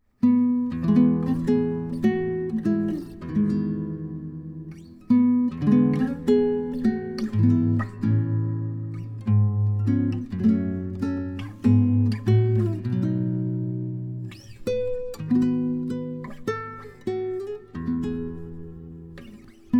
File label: jingle bells